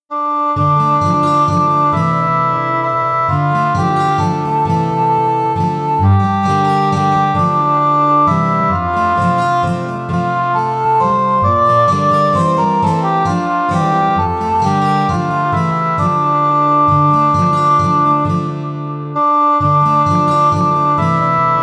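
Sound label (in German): Es kommt ein Schiff geladen, Gitarre F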